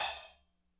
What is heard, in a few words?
HAT, KLANKY KIT